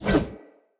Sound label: One Handed Axe Whoosh